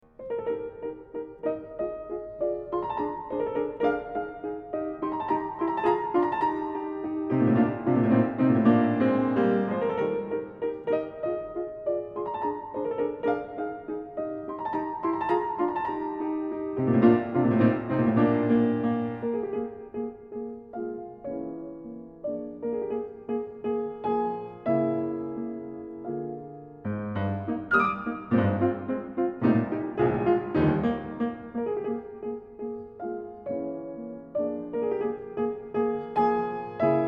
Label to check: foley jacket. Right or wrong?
Wrong